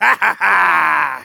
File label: laugh short, de; Demoman